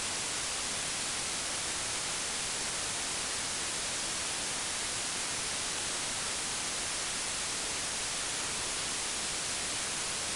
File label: whitenoise 10kHz; ホワイトノイズ; １/1oct バンド周波数分析; マスキング